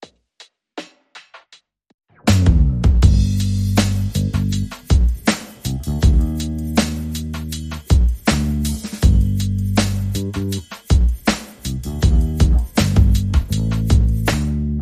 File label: Drum and Bass